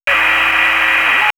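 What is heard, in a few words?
Empty Hum, electronic fx; X-Static Goldmine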